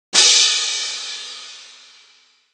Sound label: cymbals